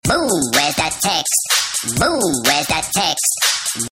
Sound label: Boom